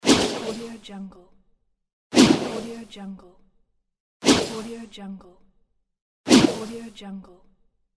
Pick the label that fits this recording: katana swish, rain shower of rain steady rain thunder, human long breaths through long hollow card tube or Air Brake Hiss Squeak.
katana swish